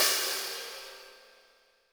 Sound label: HiHat & Crash, Roland TD-20; HiHats